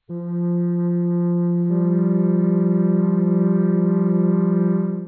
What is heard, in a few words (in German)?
flöte f1 g; Intervalle, die man durch Potenzen von 2 und 3 darstellen kann